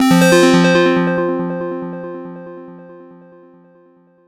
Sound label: Synth Tone